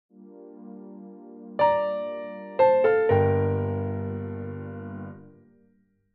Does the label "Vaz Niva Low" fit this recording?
No